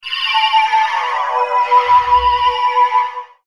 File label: Loop Synth Signals